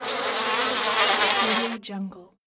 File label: flying flies